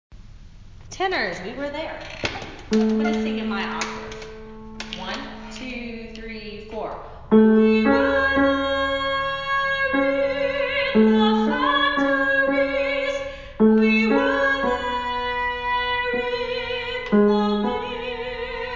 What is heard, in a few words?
Tenor